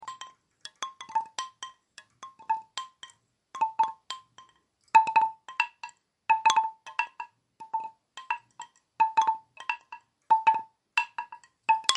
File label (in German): windspiel bambus klein